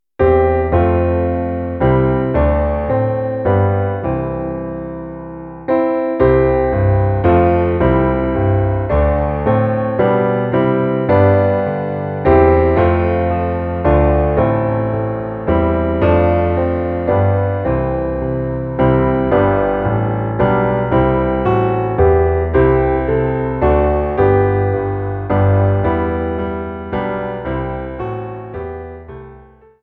macht hoch die tuer inst; Weihnachtslieder

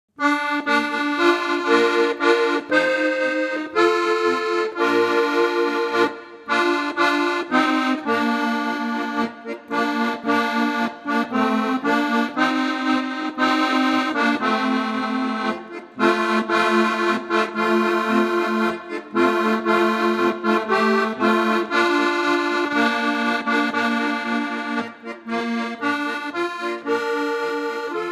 inst; im gruenen wald da wo die drossel singt

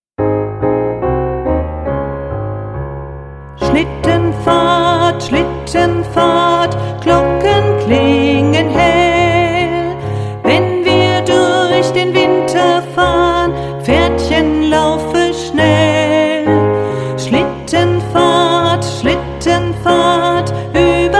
schlittenfahrt jingle bells; Weihnachtslieder

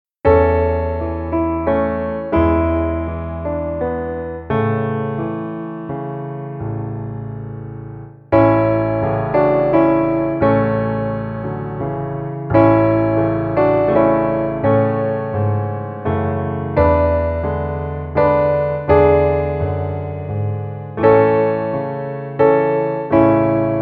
stille nacht heilige nacht inst; Weihnachtslieder

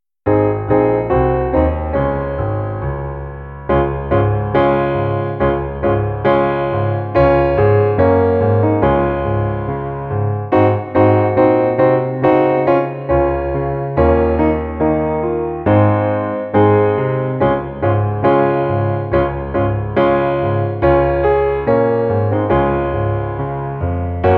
schlittenfahrt jingle bells inst; Weihnachtslieder

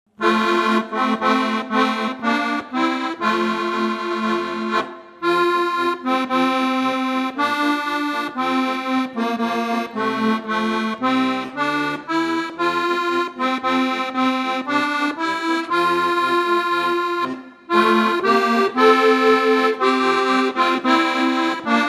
inst; von den bergen rauscht ein wasser

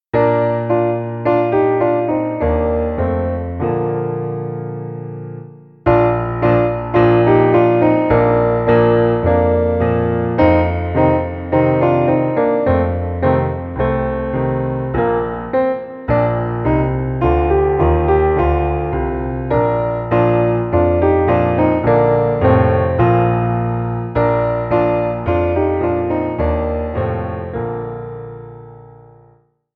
lasst uns froh und munter sein inst; Weihnachtslieder

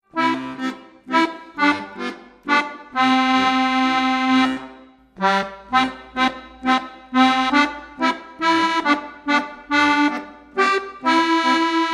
es klappert die muehle inst; Volkslieder

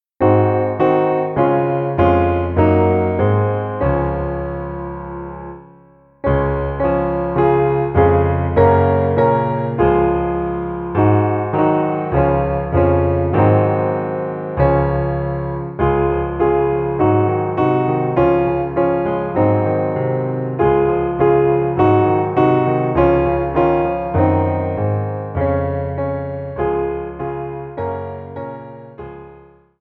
morgen kommt der weihnachtsmann inst; Weihnachtslieder

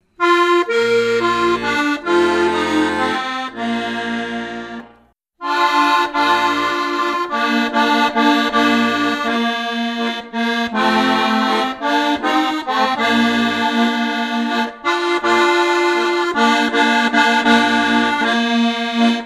am brunnen vor dem tore inst; Volkslieder

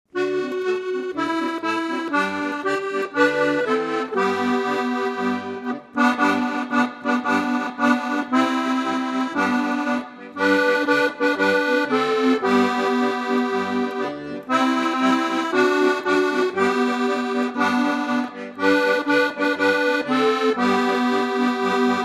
inst; wir wollen zu land ausfahren

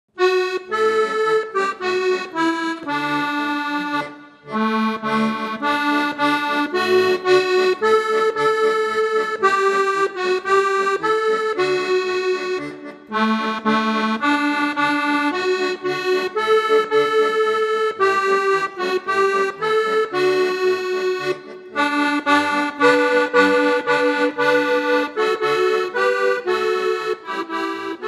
inst; wohlauf in gottes schoene welt

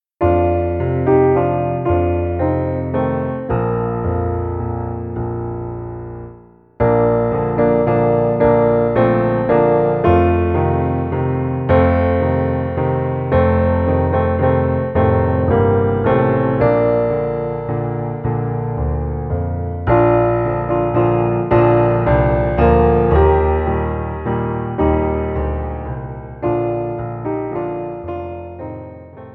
inst; Weihnachtslieder; suesser die glocken

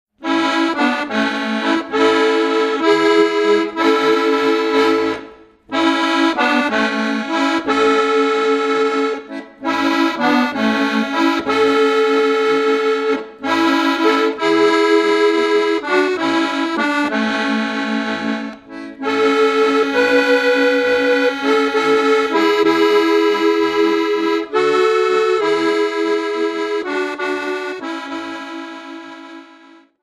waldeslust inst; Volkslieder

